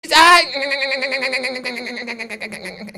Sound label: krkrkrk